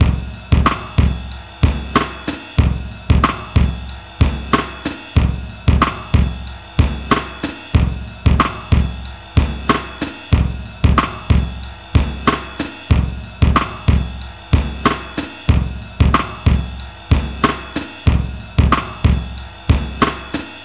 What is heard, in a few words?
drm 093, Breakbeat, 093bpm; Best Service ProSamples vol.40 - Breakbeat 2; Betsy